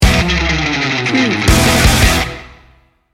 sting bumper

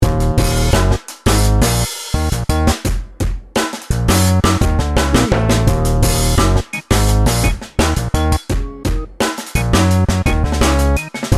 chillout funk

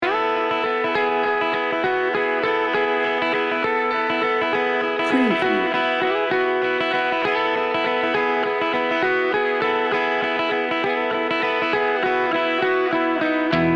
celtic pop no drums

